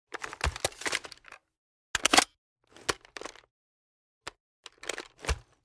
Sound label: inspect; EMXArms; mg90